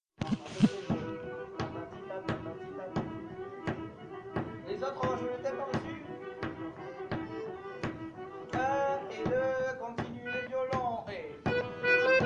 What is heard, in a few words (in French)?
Andro Rythmique violons; Nouvel Andro; Musique d-ensemble